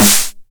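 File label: 909 SD Tape, snare drum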